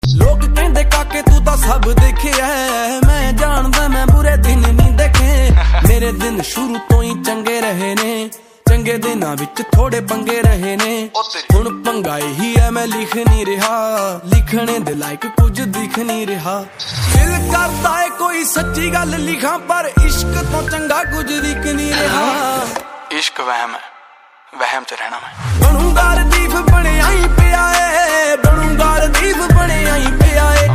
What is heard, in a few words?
punjabi ringtone